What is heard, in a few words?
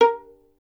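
STR violin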